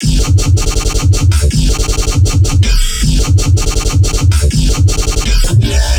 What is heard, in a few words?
Bass Loop 160 G; Trap and Dubstep; Chaos; Ghosthack; Free Trap and Dubstep Samples 2018